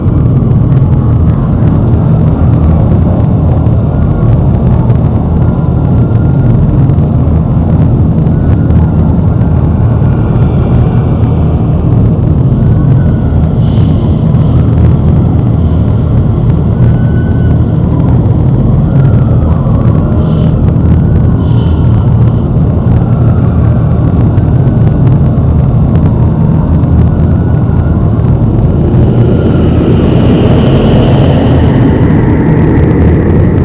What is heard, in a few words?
Amb Hell portal